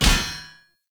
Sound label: Sphere Polearm Medium Parry Impact